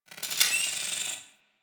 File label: Side Pillar Rand, Small Cargo Room; Warframe; Ambience